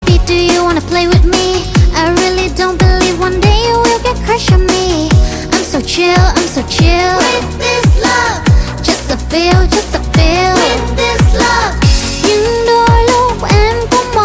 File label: rap; nhac chuong